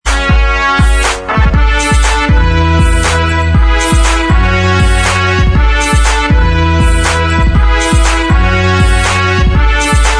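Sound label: instrumental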